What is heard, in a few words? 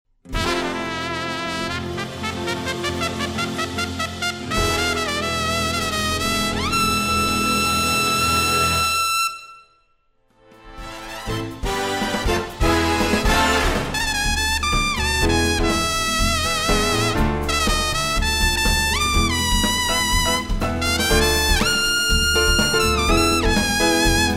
Trumpet Solo